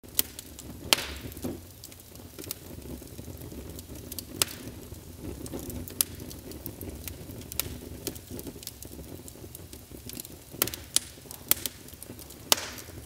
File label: Fire Ambience